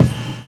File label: live kick; Univers Sons - Kitch 70s